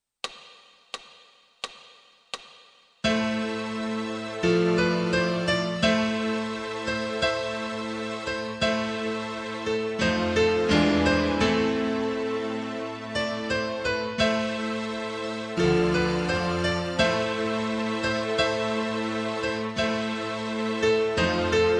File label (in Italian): Piano Tutti; Lago-dei-cigni